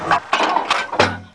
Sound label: reload big shell; emplacement